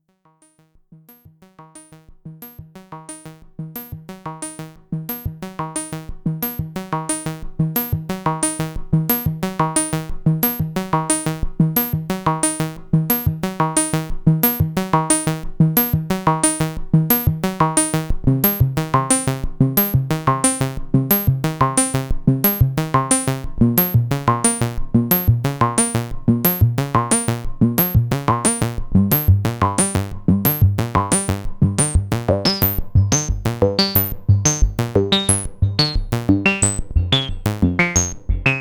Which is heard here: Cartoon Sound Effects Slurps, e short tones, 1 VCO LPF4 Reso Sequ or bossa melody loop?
1 VCO LPF4 Reso Sequ